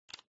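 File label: checker side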